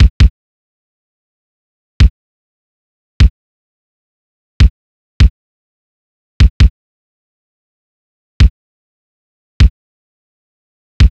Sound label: Nostalgia Drum Loop - 150 BPM Kick; Cymatics - ANALOG EVOLUTION Beta